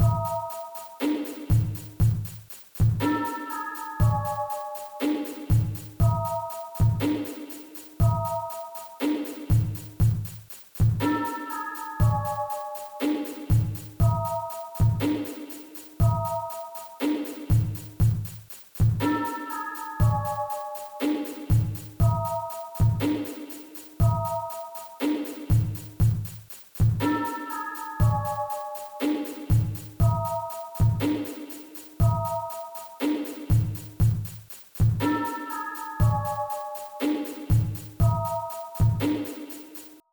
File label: Sinewave